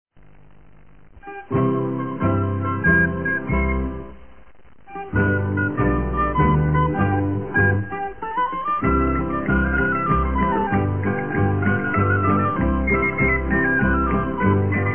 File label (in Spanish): Bolero mayorquin